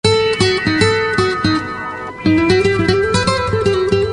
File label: melody